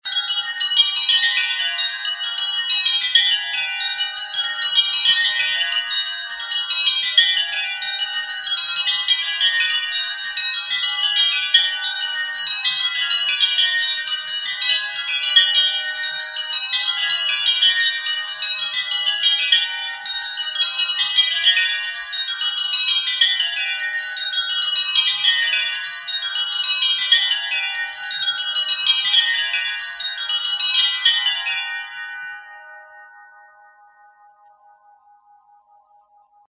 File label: Peal 8 conclusion